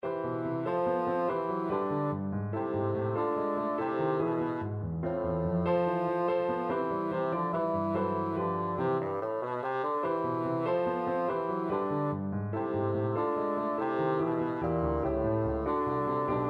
bassoon

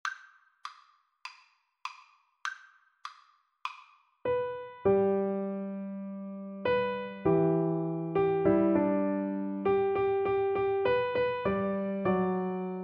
swing low Piano Duet

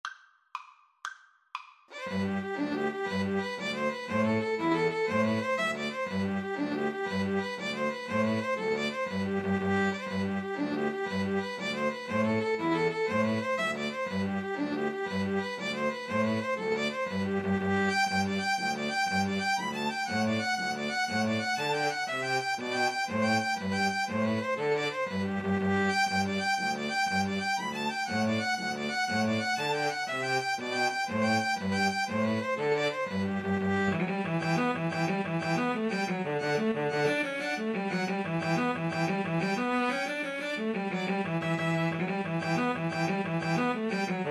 irish jig medley, String trio

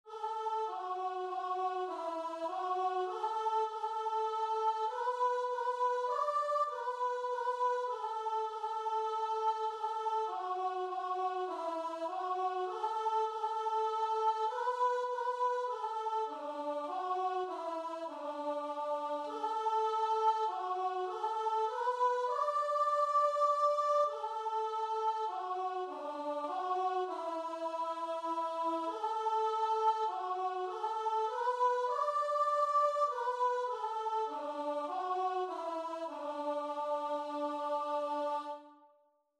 jesus loves me, guitar and vocal

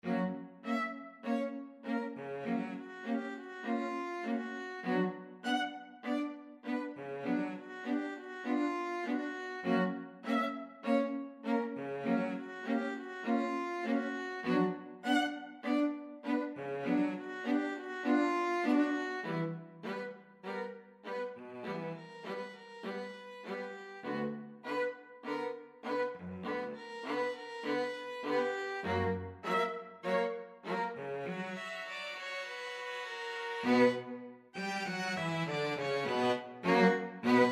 string quartet

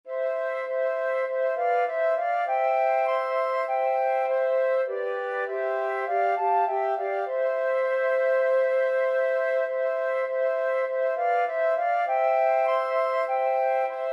flute trio

